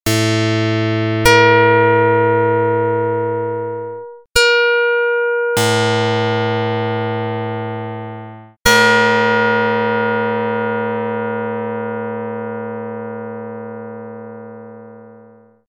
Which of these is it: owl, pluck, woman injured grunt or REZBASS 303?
pluck